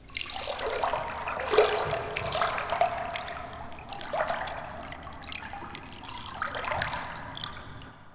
water lap verb; Quake 4 Alpha; id software; environment

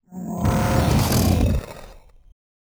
pinky chatter combat, monsters vocalizations; DoomRTX

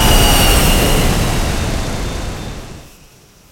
throttle off, vehicles speeder; Quake 4 Alpha; id software

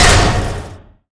spacegun cannon overf; Quake 4 Alpha; id software; turret

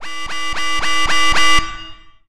incoming alarm; Quake 4 Alpha

